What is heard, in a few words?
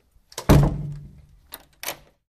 Heavy Wood Door Close; latch, metal